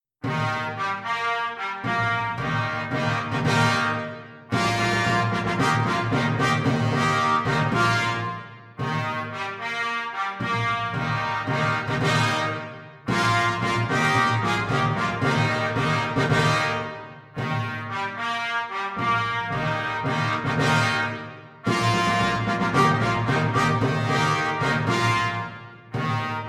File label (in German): fanfarengruß